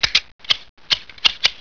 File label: Cut grass; SimTown 1.1